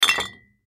Beer Bottles Empty, Beer Bottles Empty Set Down On Table; Set Downs; Objects; Blastwave; Foley